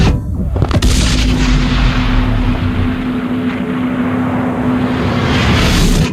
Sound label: Shockwave Hit; Barotraumatic; Geosphere